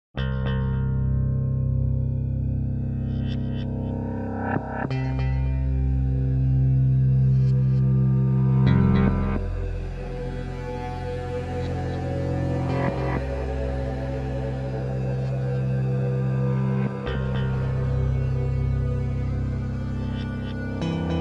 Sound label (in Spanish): musica de terror para creepypastas; precipicio